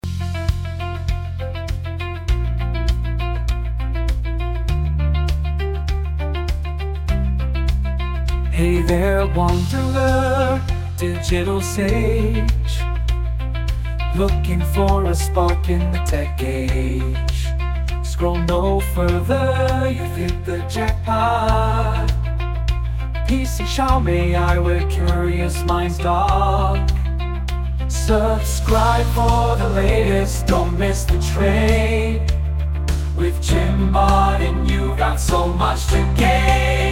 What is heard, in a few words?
AI Jingle; pcSHOWme